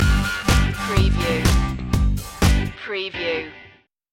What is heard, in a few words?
power agent stinger